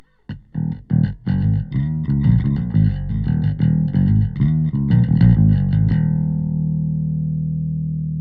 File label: 808 Bass; SubBass Doctor